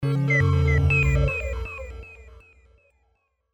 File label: Bleeps Digital